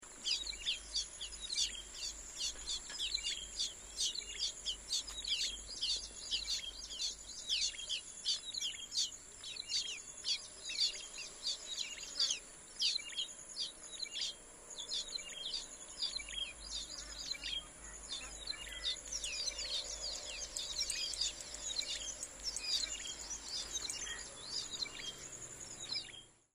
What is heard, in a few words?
Black breasted Weaver Ploceus benghalensis, black breasted weaver call